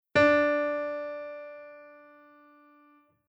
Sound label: alto chromatic scale